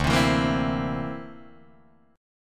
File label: D 7th Suspended 2nd Sharp 5th, Guitar Drop D